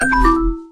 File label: ding; Simulacra